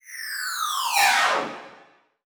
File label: Title Transition Descend